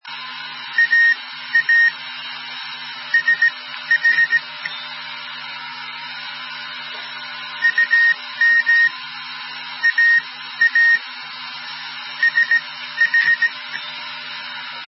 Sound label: M60 tube